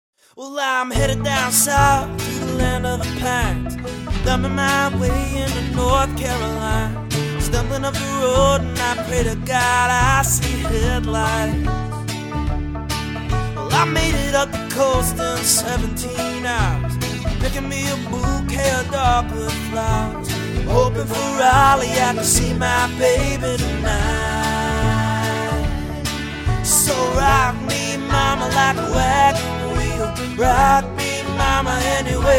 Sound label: Variety Band